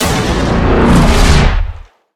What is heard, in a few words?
buildup; smite; chaac